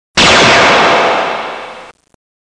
photons, electronic sounds

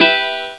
guitar; Sound Effects for Multimedia - Volume 1

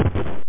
puny exp

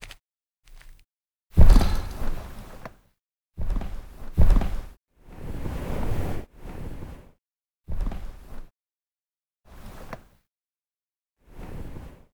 bed Laydown; Singles; French Version

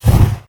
fire; kult

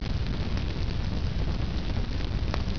bonfire

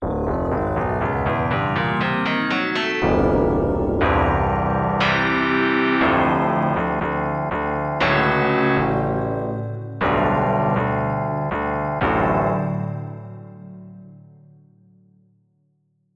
Synth Combo

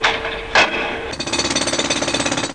construc; Children's Atlas of the United States

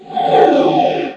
splash

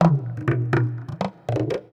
PERCLOOP ETHNO 125; eJay

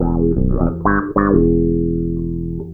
Wah Bass

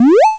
Happy; だるま 1.2.3

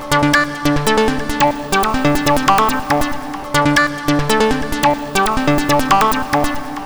Air, Techno Melody; TechnoMusicMaker

